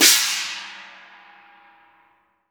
DA BA, CHINESE PERC; Spectrasonics - Heart of Asia CD2

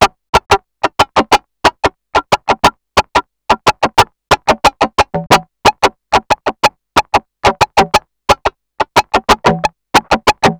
guitar scratch 81bpm; M8; random and out of place

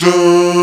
DAA GROUP; AKAI S-Series CD-ROM Sound Library VOL-2

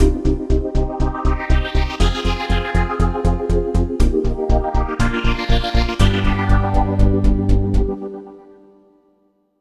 Rising Mix, Funk; Sonic Foundry (Sony Creative Software); Dr. Fink's Funk Factory; Complete Loops

